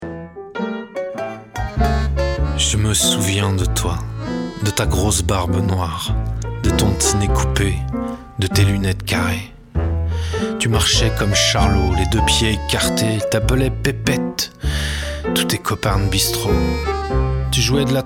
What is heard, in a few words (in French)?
CHANTÉ PARLÉ